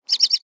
birdling chirp

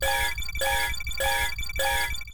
warning

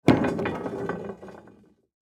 metal roll

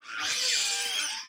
small beast scream

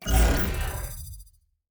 ui final result

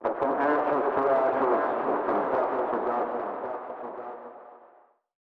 cars chant

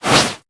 atk gmag

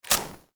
match unignited

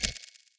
imp mini uav

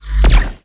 atk laser

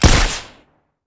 pistol

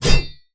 bsword

